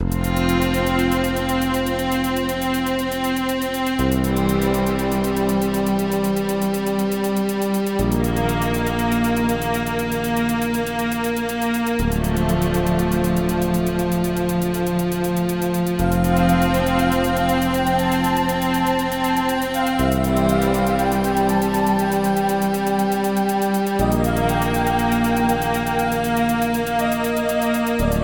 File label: Ambient; Dune 2